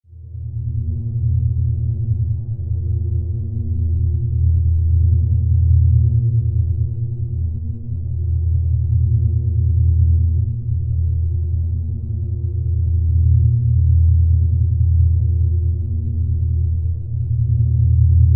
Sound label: Spaceship, Spaceship: Ambience; Spacecrafts; Stuart Duffield